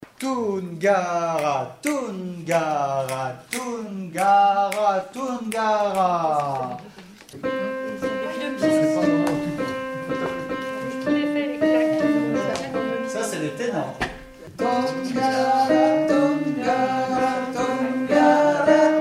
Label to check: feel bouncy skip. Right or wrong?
Wrong